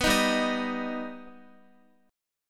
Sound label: G Augmented G Guitar; strummed